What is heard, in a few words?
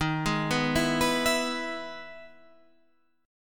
E-Major D sharp